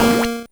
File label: multiplier A#4